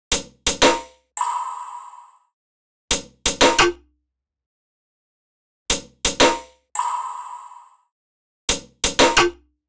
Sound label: perc loop 86, Pop; Drums; Loops; Slate; SD PASADENA Pop Sample Pack